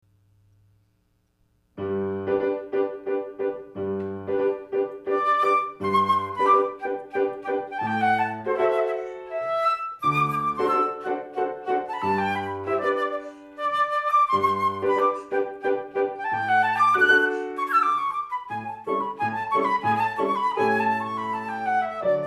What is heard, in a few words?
piano polonaise